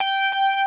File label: PEGGIATE, DANCE; Pro-Rec - Synthsonic; Z1 DANCE